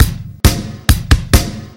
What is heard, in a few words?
Basic Rock 135